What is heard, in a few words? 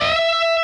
Bend full Left-hand vibrato